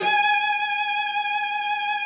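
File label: cello vibrato, G#5; Xsample VOL-7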